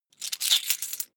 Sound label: coins big clatter fast